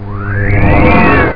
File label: TELEPT; ABUSE2